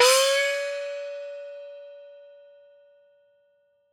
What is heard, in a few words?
Gong Small; World Percussion; Cymatics; Emperor World Percussion